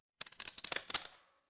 glass crack

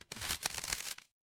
paper fabric crumple

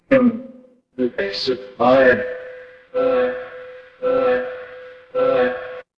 mechanical engine

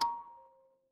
instrument kalimba

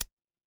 ui click

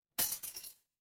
glass shatter

